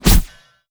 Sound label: Energy swoosh; polygoniix Intro Essentials pt2; Whoosh